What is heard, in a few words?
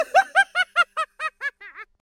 wheeze laugh